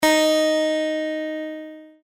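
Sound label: E flat, guitar, E flat tuning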